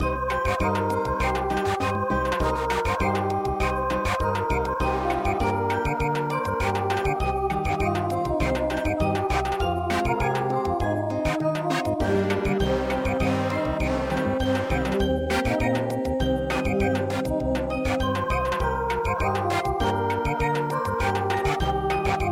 arirang Samba; traditional

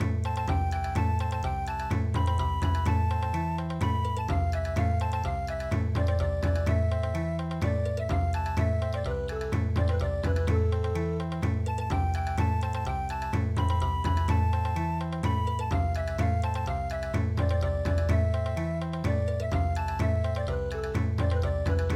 tenjin child musical band bon

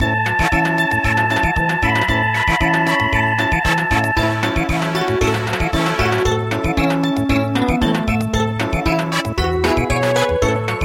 Samba, midi

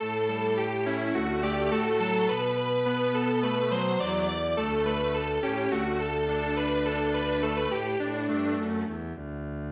Strings Quartet; harvests festival song

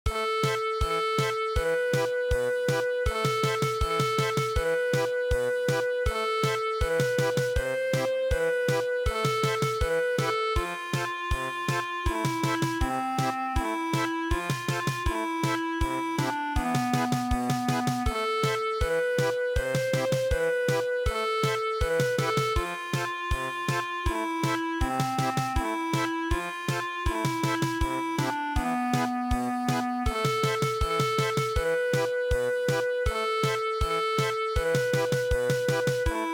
German Polka, midi; Sakura

